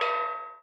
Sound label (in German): metallklang; klang im schnee